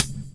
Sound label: whistle